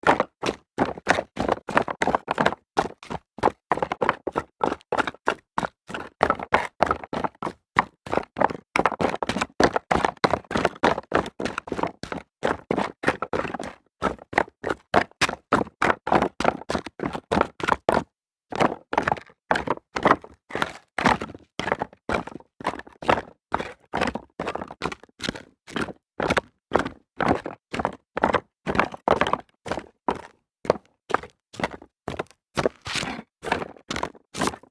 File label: running on rocks